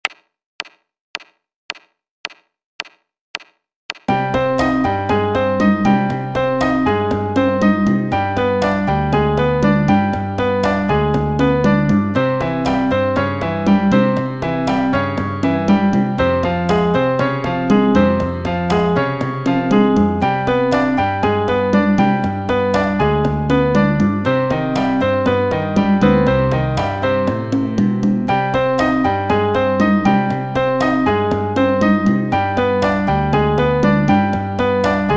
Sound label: Latin Intro